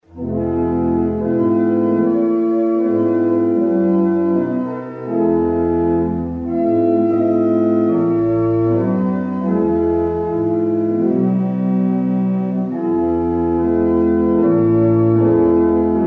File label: barrel organs; Barnston; Hollingside